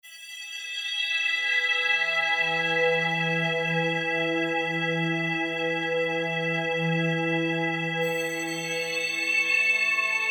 SYNTH TEXTURES - Shimmer; Veradero Shimmer; Apple GarageBand 2

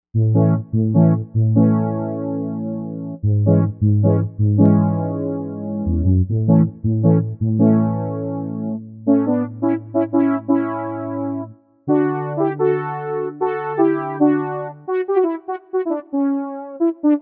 Novation UltraNova; Factory Patches

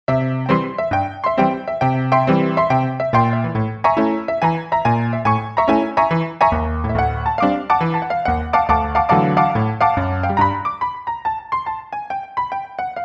Honky Tonk; Sonic Reality Modern Songwriter

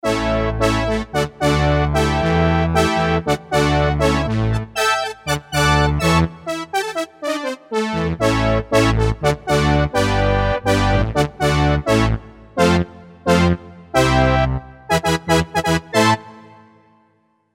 Brass 2, Roland U-220; Factory Timbres

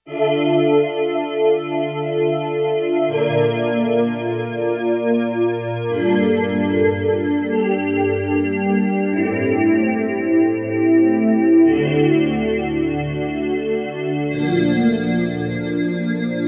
SYN CHOIR, Roland JX-1; Factory presets

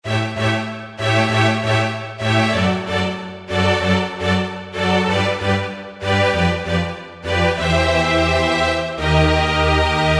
Dynamic Strings, Kurzweil K2000; STRSECT; Kurzweil K2000 Orchestral ROM; Orchestral ROM Supplemental Disk

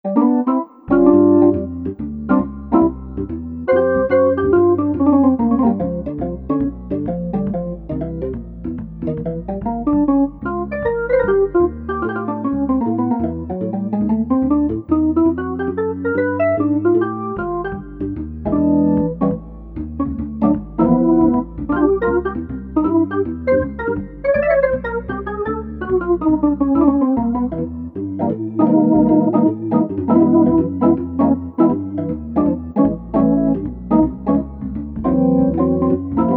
Jazz, Drawbars examples

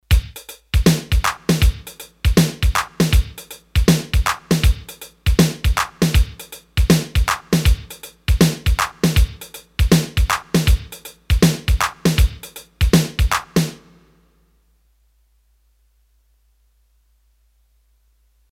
Shuffle, Roland D-10; Rhythm Demos

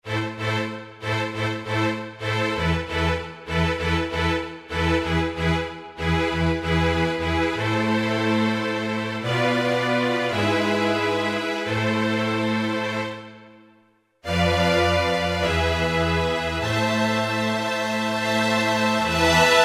Touch Strings; Kurzweil K2000 Orchestral ROM

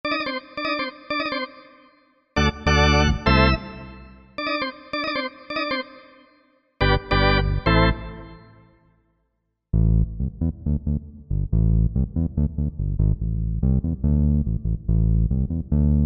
Bass + Full, Korg MSC-9S Organ

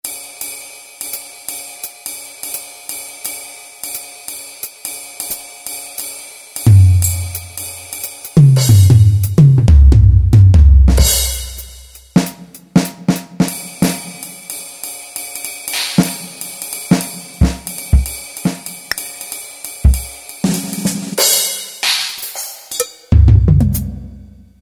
Jazz Kit; Yamaha YPT-400; Voices

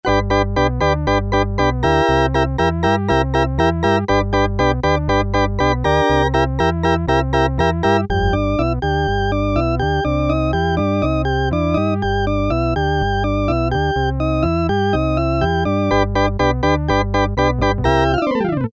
Tease, Yamaha TX81Z

